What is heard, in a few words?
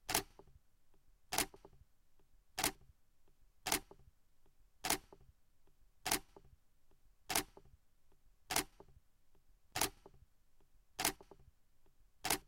Canon EOS 80D